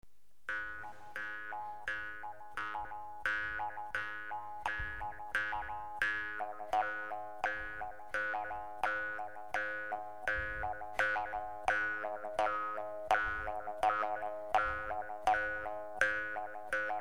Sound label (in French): guimbarde technique de langue